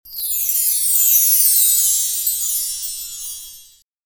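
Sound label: kirakira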